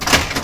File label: Drop Target Reset